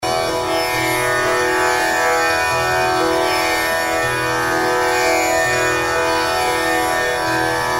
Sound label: Drone, worldpop